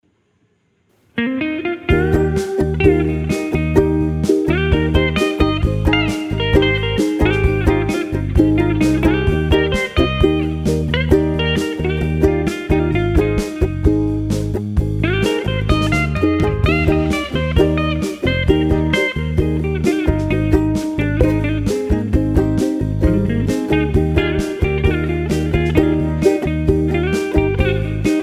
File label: Uke